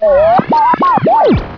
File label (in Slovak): Ladenie radia; Vesmir; Revelation Sight and Sound SK